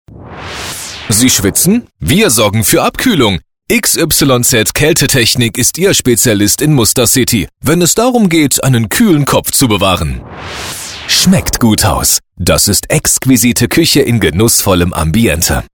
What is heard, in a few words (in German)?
schmunzelnd genussvoll